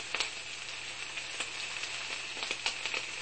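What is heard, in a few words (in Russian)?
пожар хрустящий спокойный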